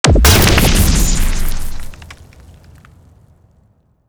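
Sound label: tripmine explosion boom; weapons; Half Life Alyx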